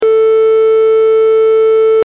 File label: triangle wave